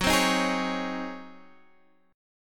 C sharp Major 7th Suspended 2nd Suspended 4th F sharp